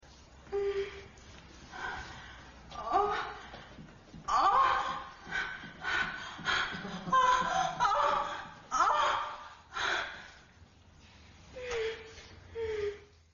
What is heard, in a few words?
moan